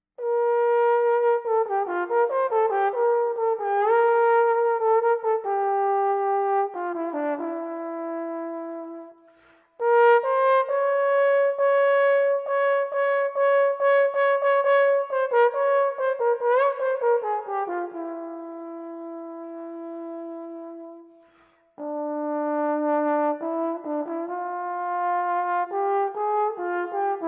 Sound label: Bolero Trombone Solo